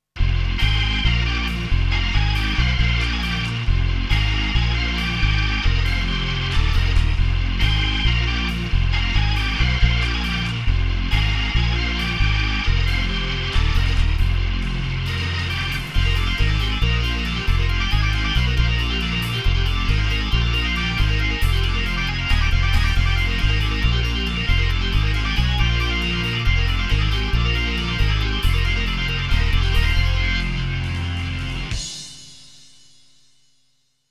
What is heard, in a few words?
B3 Organ; Masterbits CLIMAX 8